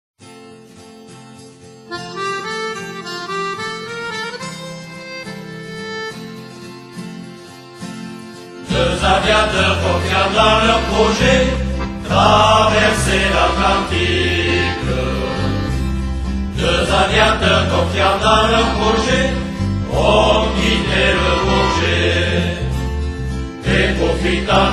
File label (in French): L oiseau blanc chant